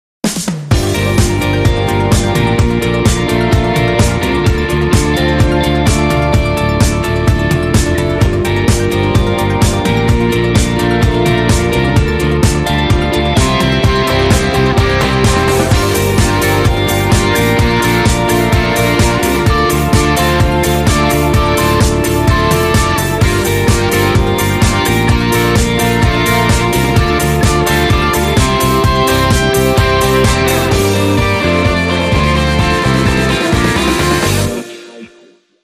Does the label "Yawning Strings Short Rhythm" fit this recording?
Yes